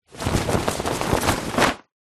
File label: foley flag movement shake; zapsplat